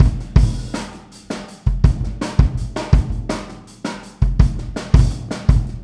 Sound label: Boogaloo, Kick, 82 BPM; Drums; Verse; Sonic Foundry; Drums from the Big Room The Mixes